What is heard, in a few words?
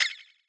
Click Higher